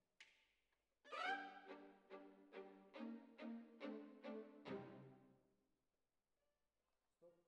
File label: Violins Over